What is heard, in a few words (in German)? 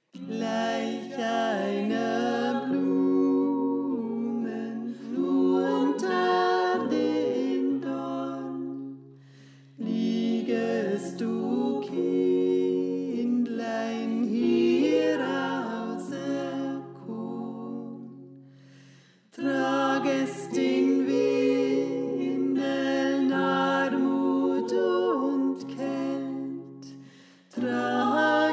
Tenor; Gleich Einer Blumen